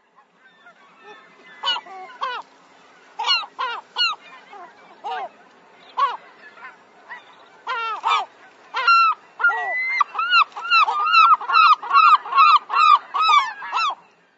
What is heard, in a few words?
Thayer's Gull; Birds